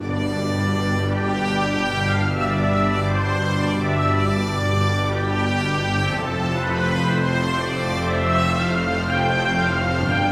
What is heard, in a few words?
Music Debrief Good Sting